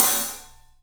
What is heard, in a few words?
3D Percussives, Studio Kit; E-MU Producer Series Vol. 5 – 3-D Audio Collection